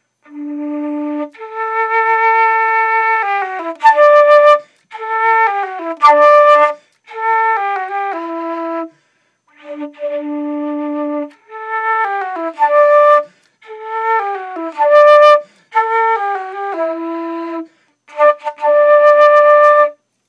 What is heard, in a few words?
flute